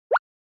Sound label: Anime Motion High